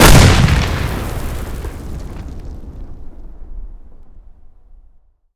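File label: grenade explosion close; Escape from Tarkov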